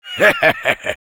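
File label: laugh evil, French; Rire méchant; Heavy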